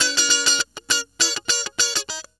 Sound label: Electric Guitar; Sonic Foundry (Sony Creative Software); Mac Money; Guitars